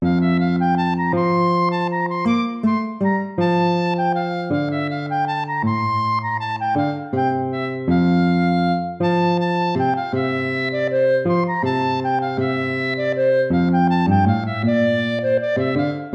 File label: lute